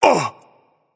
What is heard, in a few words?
nv surprised, vox; smite; voicepack; vulcan